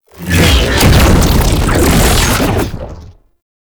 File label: Medic Shield Drone Cast; Abilities; Warframe; Tau; Dax Medic